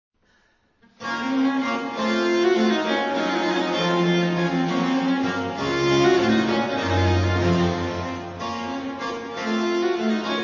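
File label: B minor Menuets; Marais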